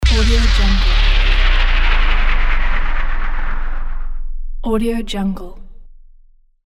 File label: downer